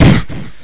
pain, player; Games World; Quake; aamoon

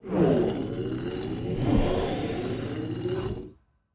activation Rune Fade In; Keepsake; puzzles

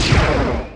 PLASMA; INCOMING

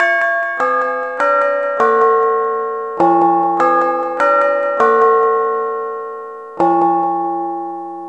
Bigben; MOBICLIC 14

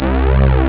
detune, synth chords

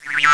synthectic; Sound Effects for Multimedia - Volume 1; electronic sounds

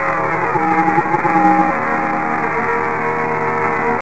Noise; PCGamer50

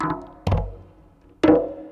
PERCLOOP ETHNO 125; eJay

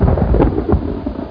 lightning; sony

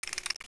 small spider approach; Curse of Dragor

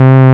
Saw C3; D-SoundPRO 2.3

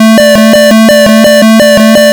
PANIC

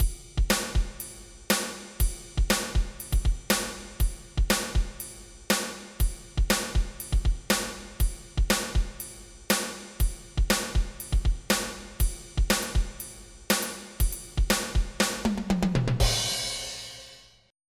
quart rok

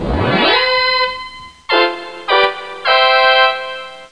Horn Fanfare; Professor Fernleaf from the Tropics

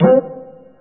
MUT CUICA; Real Oldies2

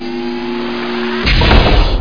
HYDRO; Duke Nukem 3D Kill-A-Ton Collection

